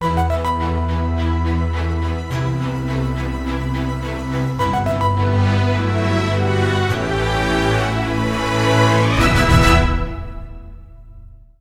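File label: jingle stage start; Tousou Chuu The Great Mission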